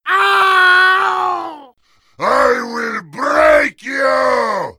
death; freak fortress 2; thieving duo; thief

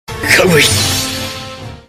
teleport effect; theo akatsuki

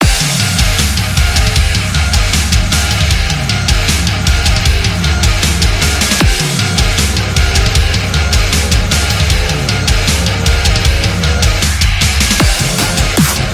intro music; ff2; demonic wizard; duo

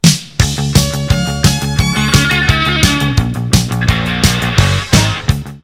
intro music; freak fortress 2; pedo bear

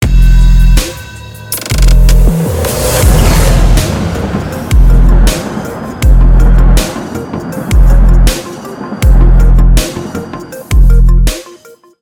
intro music; w scout

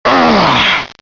stunned; xinalized

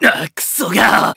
stabbed; hametsu

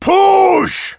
superjump; freak fortress 2; sans

